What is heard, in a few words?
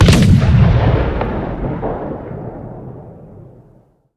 art cannon shot far; guns; War Thunder